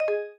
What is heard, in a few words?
disconnected; NVDA